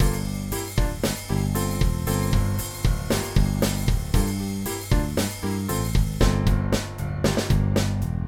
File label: transformer default; SSL Fusion transformer